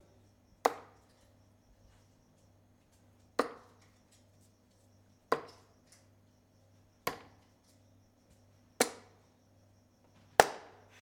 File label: Darts